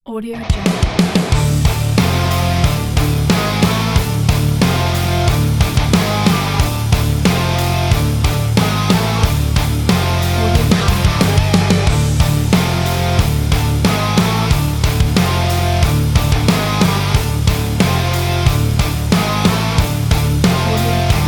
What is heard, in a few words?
energetic hard rock